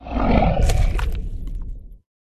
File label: die